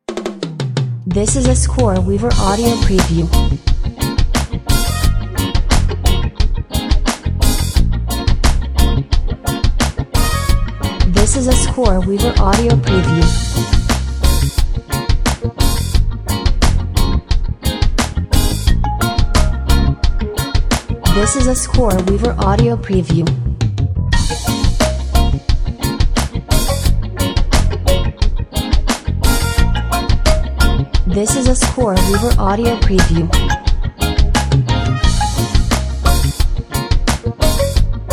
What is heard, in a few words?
reggae rum punch full length